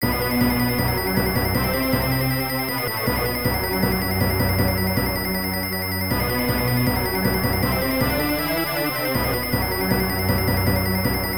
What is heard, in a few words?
Boss Appearance, Speech Music; asura blade sword of dynasty fuuki fg 3 system arcade